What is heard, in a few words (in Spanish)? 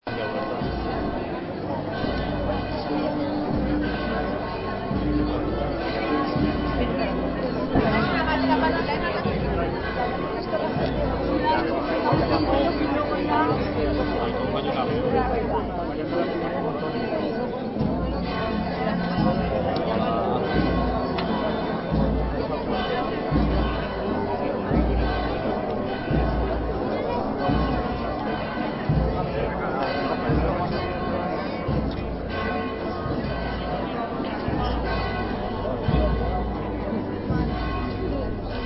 Campanas